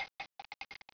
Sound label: m3 shell, weapons